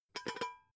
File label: pot lid shake